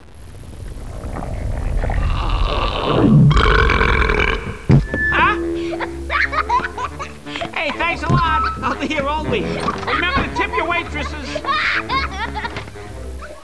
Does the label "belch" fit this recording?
Yes